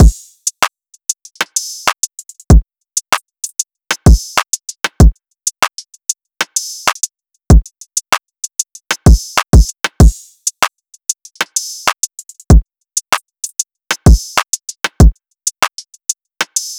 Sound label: Drum Loop - 96 BPM